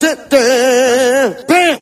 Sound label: da dahhhh bam, Voice; ooh ahh